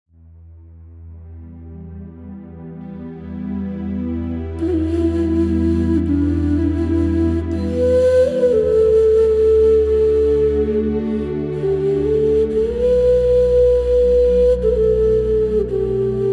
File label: Scene Music; Age of Wushu; Windows